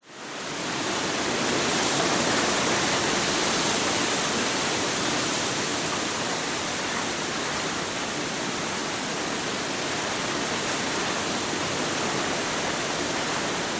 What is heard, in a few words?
wind strong tall trees storm; nature